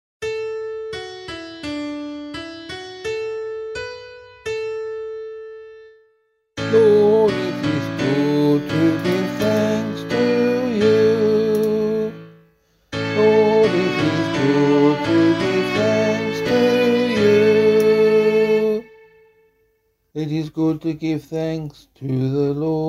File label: Psalm vocal